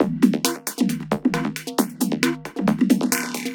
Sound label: NEURON PULSE GROOVE PHASER; FRENETIC FRACTAL GROOVES